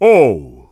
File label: "Oh"; King Tuft; zelda